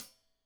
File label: Hat Tight, Hi-Hat, Cymbals; Deeply Sampled Drums